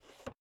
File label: Aircrafts P-51D Cockpit Fuel Shut off Off; DCS